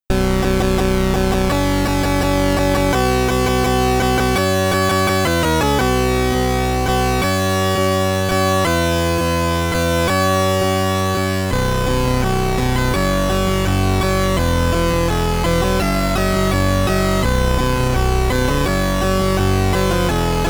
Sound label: Ending SID Stereo; Gemini Wing; Commodore 64